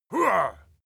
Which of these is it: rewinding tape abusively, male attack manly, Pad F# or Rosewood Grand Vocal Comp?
male attack manly